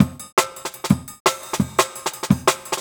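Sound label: Jungle Breaks, 170 bpm; Bruck; Original Jungle Breaks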